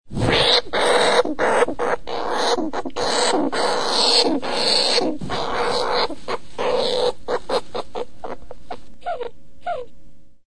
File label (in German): kreischen, hamster